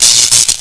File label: electric; cstrike